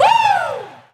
Vocal Oneshots; Vengeance Samples; Vocals

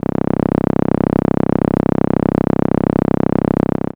Contra C1, ARP Solina, contra bass; musicradar-synth-samples

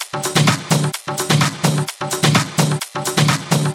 Percussive Loops 128BPM; Vengeance Samples

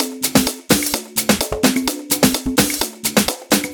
Latin House Loop 128 BPM; Vengeance Samples

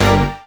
Synth Shot; Vengeance Samples

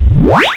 Sweeps Short

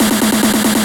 Fill 140 BPM

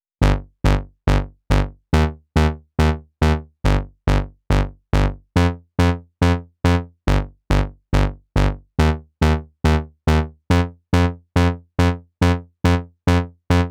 Bass, 140 BPM; Vengeance Samples; Song Kits; One Second

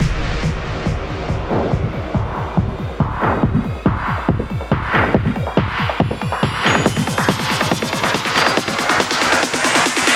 140BPM 8Bars, HandsUp & Trance Fills; Glory; Vengeance Samples

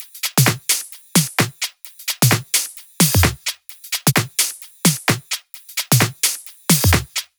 130BPM Kit; Vengeance Samples; Take Top Kit

